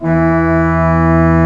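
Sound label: pedal E2, organ pedal; AKAI S-Series CD-ROM Sound Library VOL-2; ORG PDL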